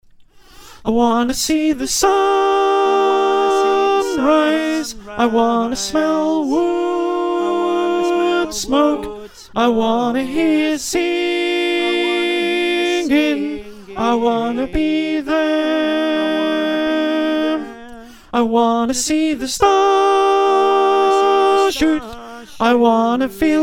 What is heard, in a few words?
Alto, choir